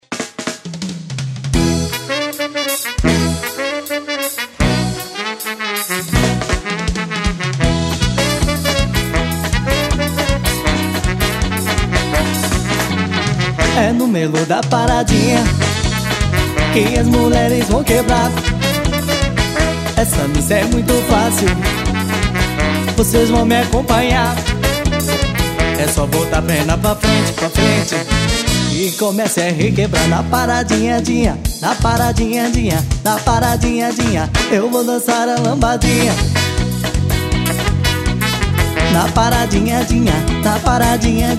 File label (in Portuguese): swing